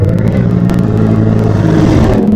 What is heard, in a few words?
Bear, Wavetables; Cymatics; Serum; Animals for Serum - Gold Edition